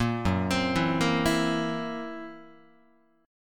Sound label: Gb 7th Flat 5th down, Guitar; arpeggiated; JGuitar